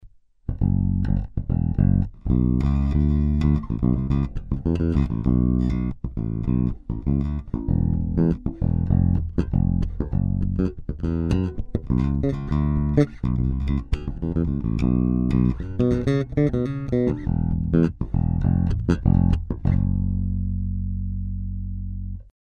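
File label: fender deluxe ativo groove, bass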